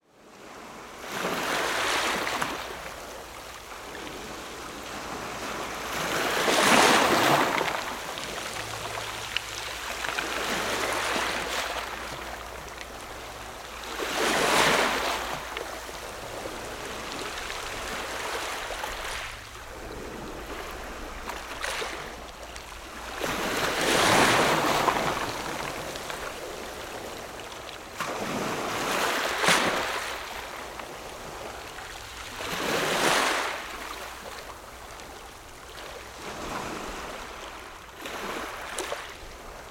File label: water ocean waves calm onto stoney beach and rocks